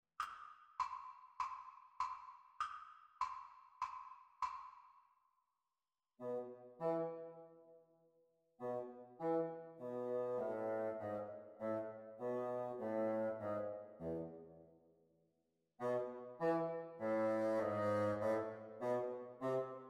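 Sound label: Bassoon Duets; Exam Speed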